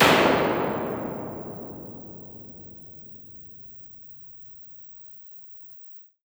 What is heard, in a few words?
EMT 140 Plate